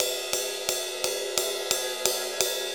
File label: Ride Cymbal Pattern; Percussion; Essential Percussion; Ride Cymbal Patterns